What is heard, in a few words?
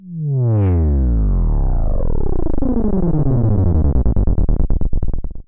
Power Down, techno drum fx; Single Hits